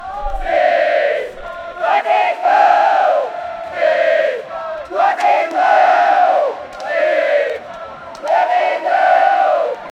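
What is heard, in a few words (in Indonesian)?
suara masyarakat indonesia, protest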